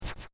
BEEP ELECTRONIC SLASH DOUBLE, User interface feedback sounds; Multimedia